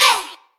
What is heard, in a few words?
Percussion Hey sound